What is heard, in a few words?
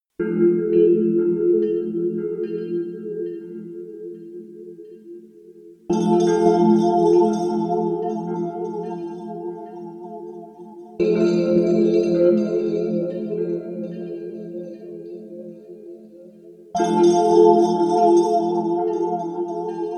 melodic rattling bells